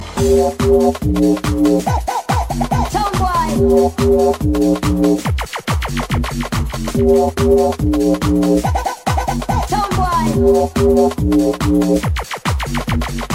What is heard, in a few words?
SPEED GARAGE RINGTONE